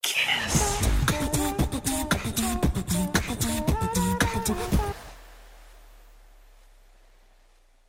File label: beatbox; kiss